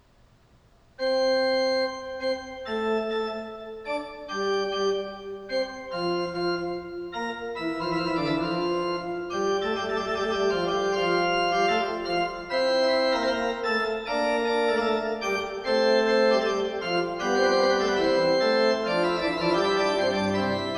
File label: Fugue, Organ; Magnificat quinti toni; Johann Pachelbel; Complete Organ Works